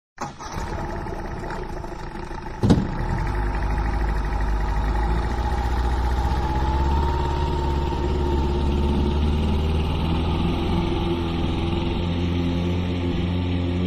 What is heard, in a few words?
Boat Engine, motor boat